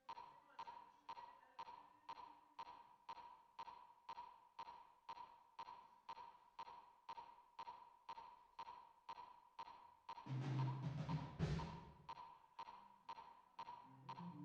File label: DRUM VERB